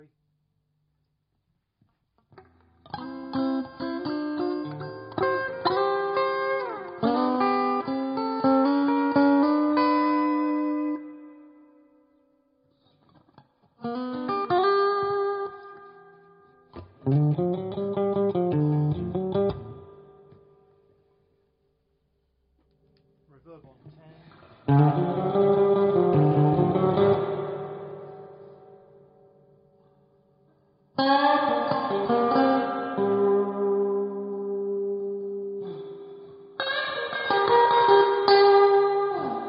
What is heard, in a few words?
reverb on 3 then 5